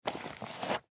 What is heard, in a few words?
Tennis Bag Grab, Tennis Bag Grab Tennis Ball; SoundEffects-Blastwave; Sports